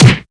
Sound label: punch; SINDEMO; impact